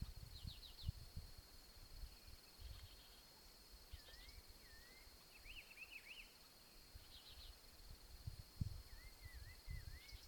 Tangara Picudo, Tangará Picudo (Euphonia chalybea); Hermosa pareja, vocalizando constantemente!

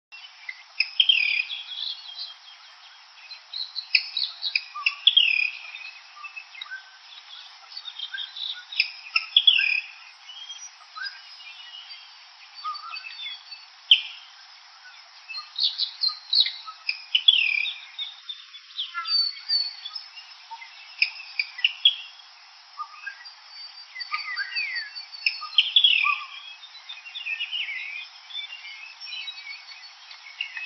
Mosqueta Ojo Dorado; Predelta

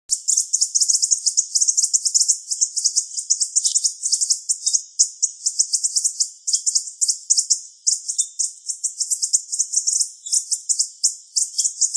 Frutero Yungueno

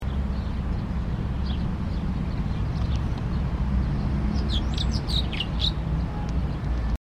golondrina parda

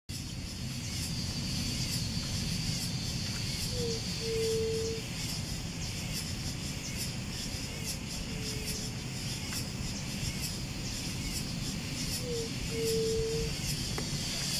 Yeruti Comun

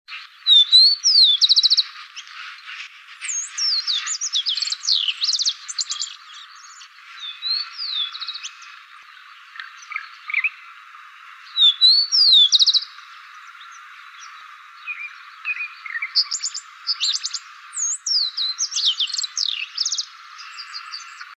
Chingolo y Renegrido

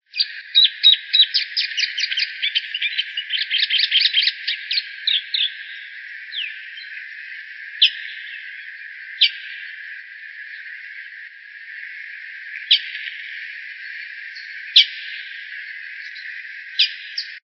Corbatita Domino

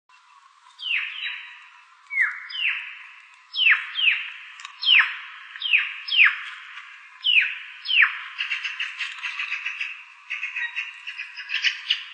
Urraca comun

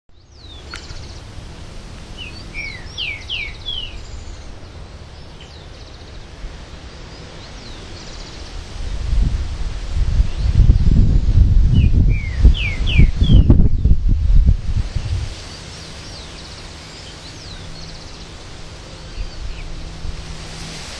pepitero verdoso; otamendi

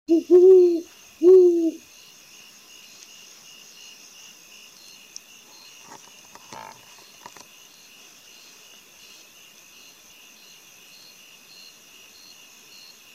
voz nacurutu

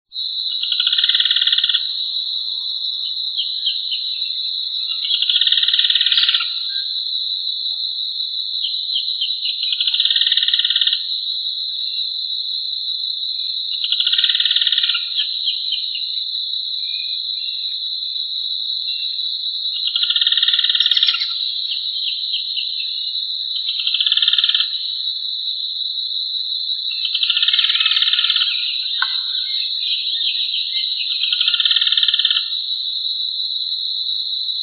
Curutie colorado; Diamante

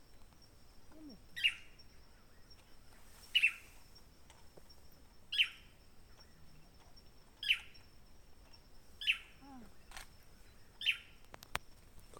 Bailarin Azul; Chiroxiphia caudata

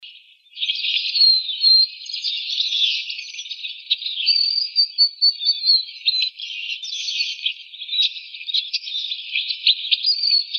Varillero congo macho; Varillero Congo (Chrysomus ruficapillus)

